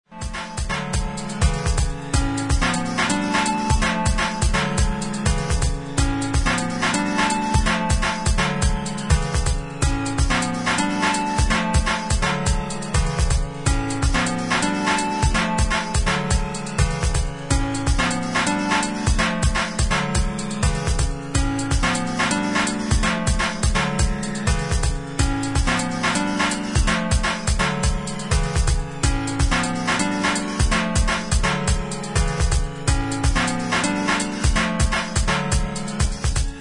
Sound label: AMBIENT EXPERIMENTAL